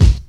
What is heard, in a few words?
bumpin hip hop steel kick drum sample b key